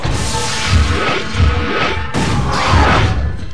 iris Open; minetest; stargate

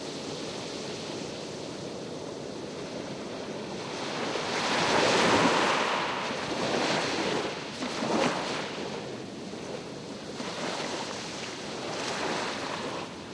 beach; minetest; ambience

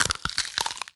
eat; minetest; stamina

